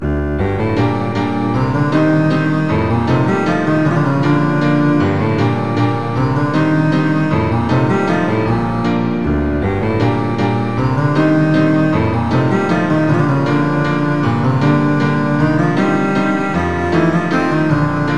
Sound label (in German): cello u klavier; walzer a moll; chopin